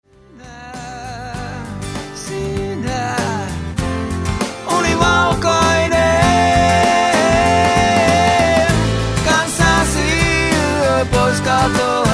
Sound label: unplugged